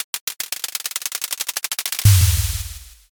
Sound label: drumroll